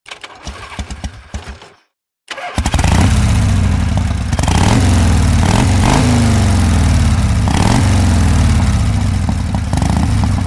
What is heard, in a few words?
Constant Harley Davi; harley-davidson